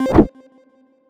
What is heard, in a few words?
Sound Powerup Trigger Entrance